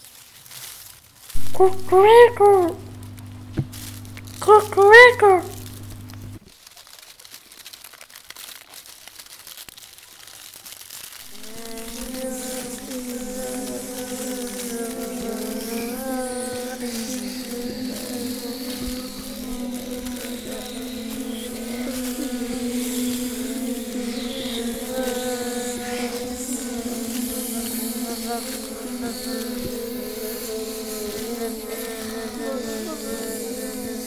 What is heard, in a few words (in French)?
Ambiance sonore; Andre Lhermite